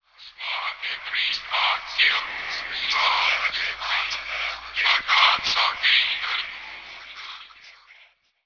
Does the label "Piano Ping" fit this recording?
No